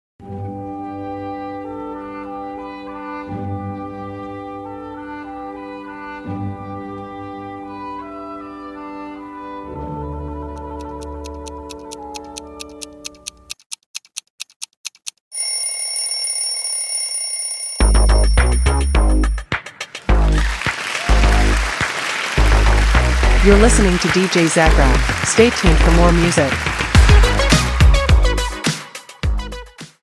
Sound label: Cartoon Morning; Zagrath; Zagrath Show Intro #2